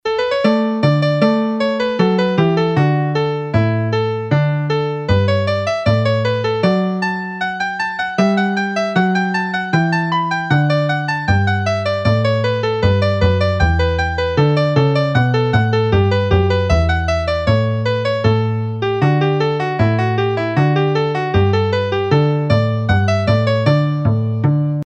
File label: Carolans Concerto